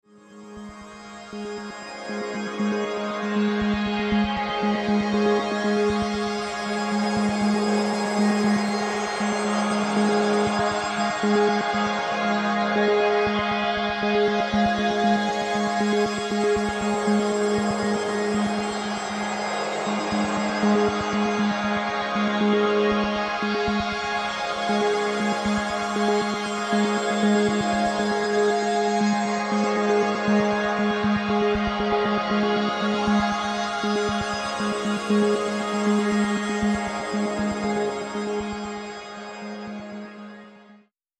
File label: Sustained Minor Chord; Beds